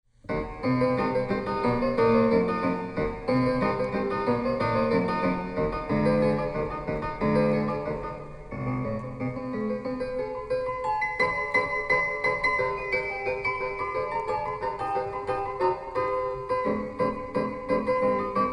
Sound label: inverted maple leaf rag